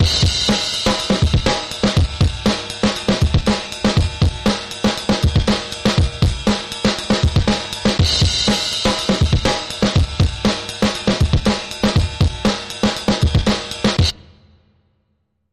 Dig That Crazy Beat

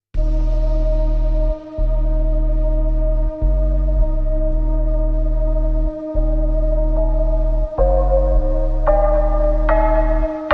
Slowed Reverb

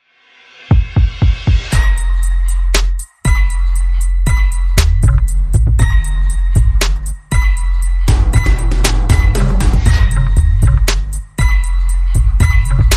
Cool For School No Vocal

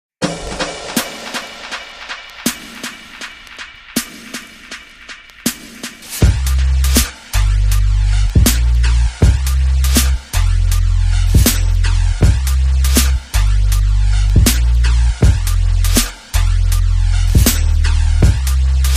Strumming

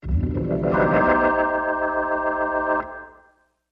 Organ Gliss Up

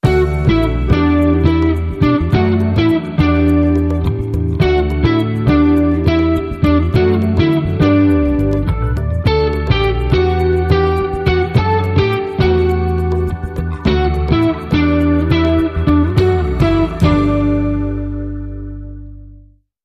Easy, No Drums

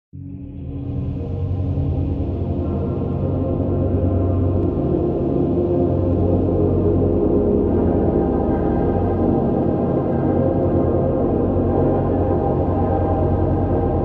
Drone

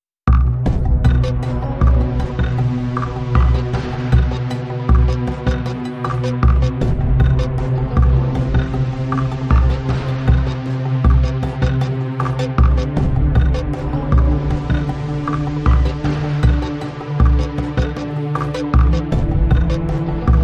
Ring Movement, No Piano

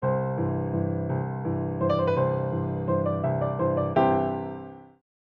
Delicate Piano Etude

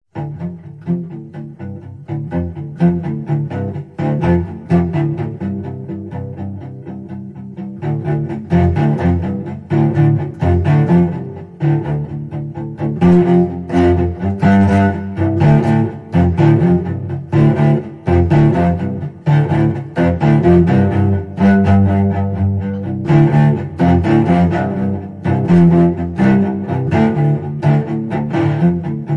Cello Danger Action

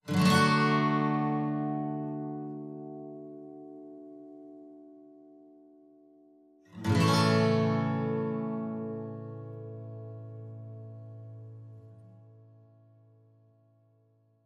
Wonderful Moonlight, No Drums

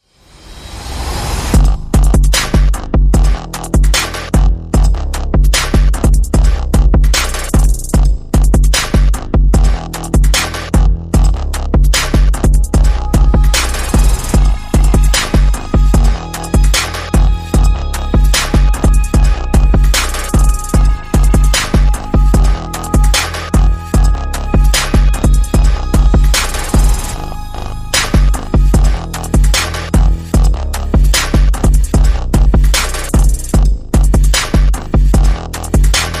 No Vocal

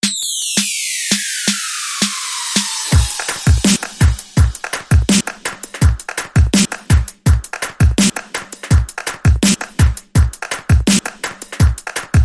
Beat

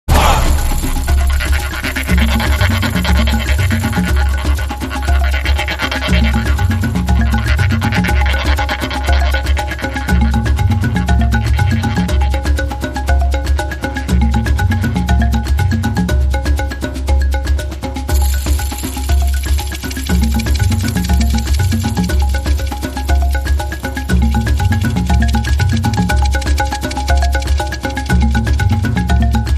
Balarimba

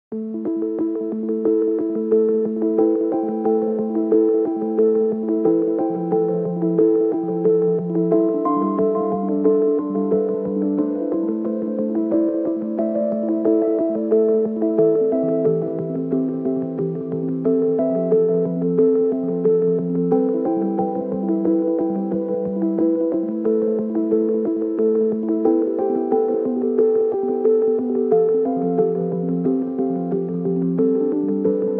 Traces Synths Only

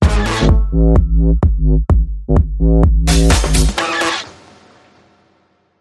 Booty Bass, Sting

